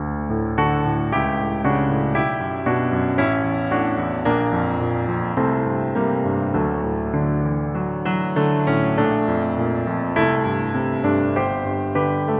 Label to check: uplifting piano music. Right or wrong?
Right